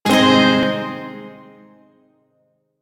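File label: Shawzin Minor Chord; Warframe; Duviri Shawzin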